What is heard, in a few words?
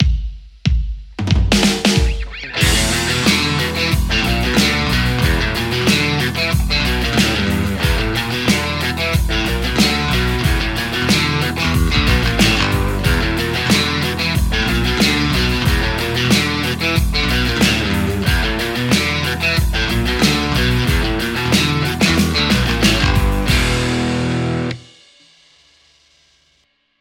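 Stratocaster JCM800 hot; mercuriall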